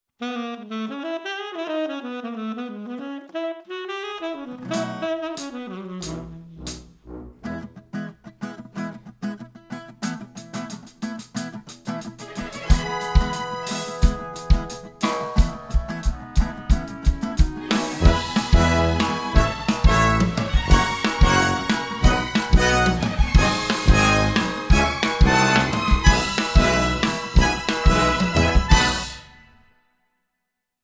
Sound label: Happy Orchestral Pop